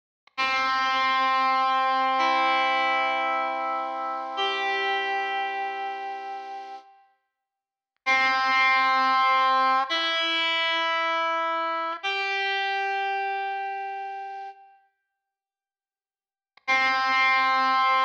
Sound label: Q Legato Effekt Guitar